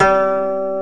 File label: KOTO G1; AKAI S-Series CD-ROM Sound Library VOL-7